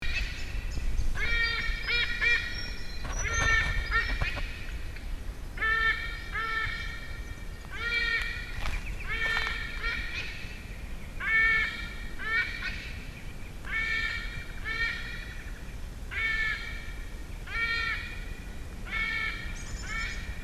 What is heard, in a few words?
Icthyophaga Leucogaster; White-Bellied Sea Eagle; Call